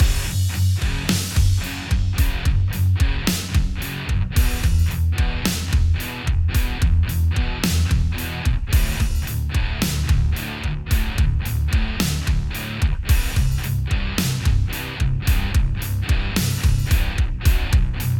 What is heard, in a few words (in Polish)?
przebieg 55 e moll